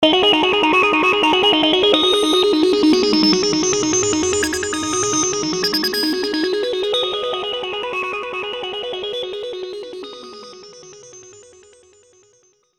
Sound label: Phase Arp One-Shot; Industrial